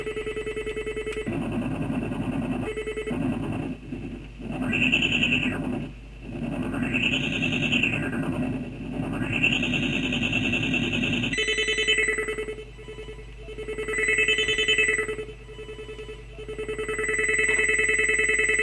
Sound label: ESP32 fs 10kHz DAC 8Bit Elliptic Filter